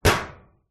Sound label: impact metal thin